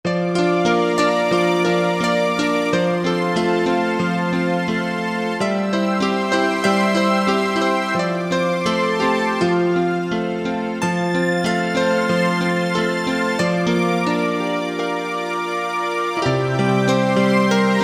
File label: Pno Concert, Percussion